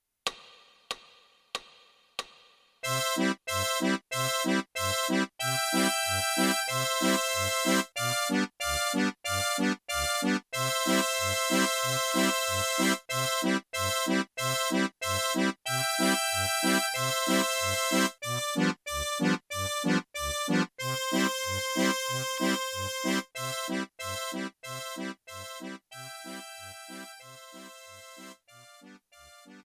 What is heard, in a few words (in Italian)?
Arpeggio Marcia a Ottavi; Karl Czerny